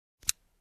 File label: Lighter Switch